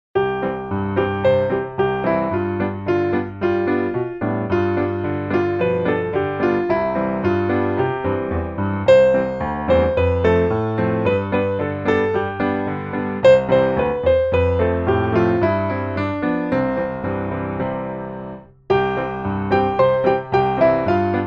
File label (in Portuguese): Conta cada bencao piano